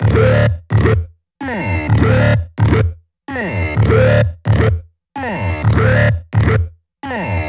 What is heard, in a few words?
128BPM Melody; Vengeance Samples; VFH1 128BPM Basique Ale Kit